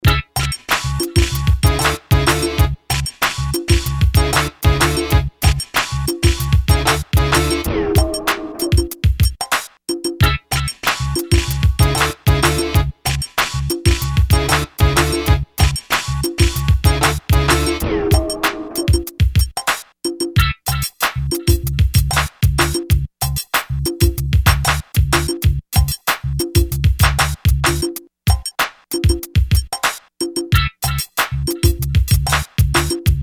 Jazzy funk

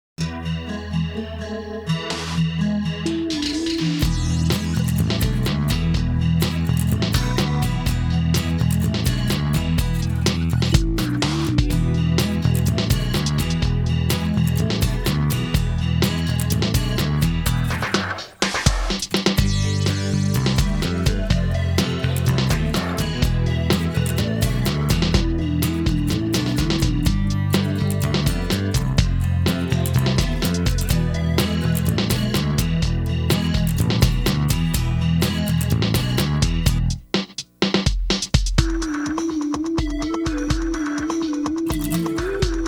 pop track

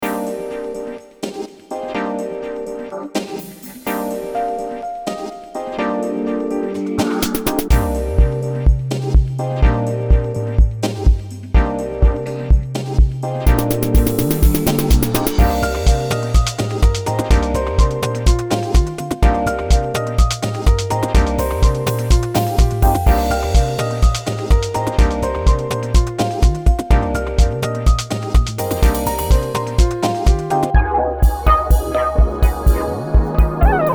electronica dancey

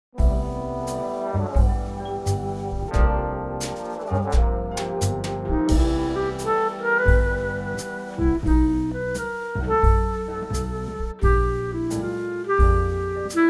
1940s Slow Dance

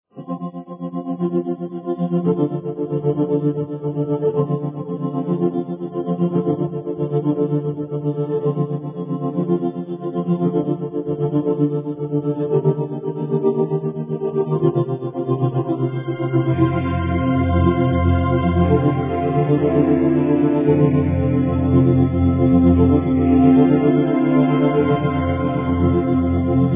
ambient heavenly

